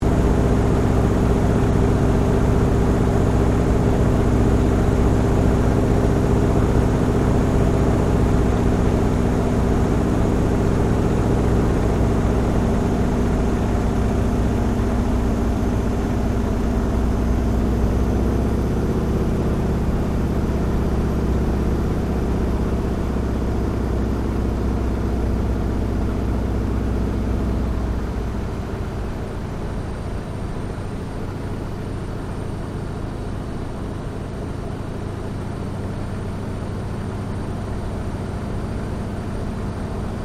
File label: AIRCRAFT BOEING PT-17 STEARMAN APPROACH INTERIOR, Stearman Trainer; 1939; Airplanes; Vehicles